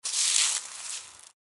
dry leaves footstep; 足音

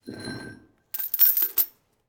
Footsteps Chain

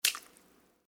Footsteps Puddle One

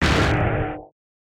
dark magic small; 魔法/法术的音效

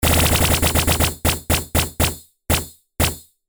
consecutive impact, mahjong; ボードゲームやカジノ、麻雀の効果音です

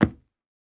footsteps wooden floor; 足音

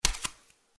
Footsteps Barefoot One

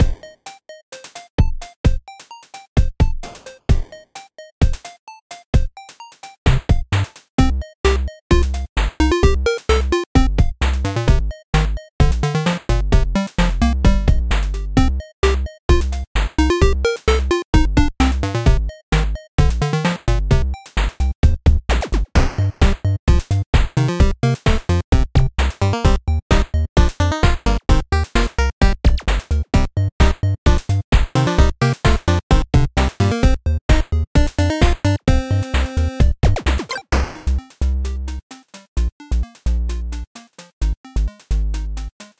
chiptune intro, 130 bpm

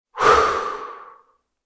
Breath

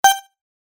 cursor

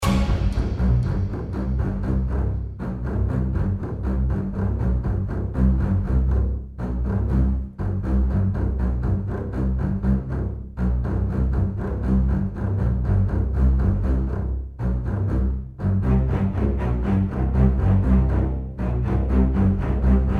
horror 120 BPM loop; アドベンチャーゲームやノベルゲーム; Adventure Game Music Pack

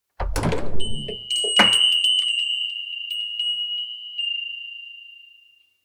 the doorbell rang; lifestyle

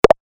move

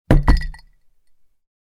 Put down the glass with ice

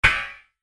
shield metal; 武器効果音

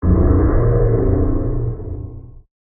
gravity magic middle, magic spell; 魔法/法术的音效

